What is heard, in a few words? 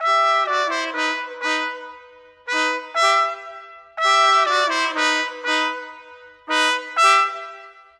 Modern Trumpets, Loop Samples, Orchestral; Sonic Foundry (Sony Creative Software) - Crimson Blue and Fabulous Horncraft 4 RnB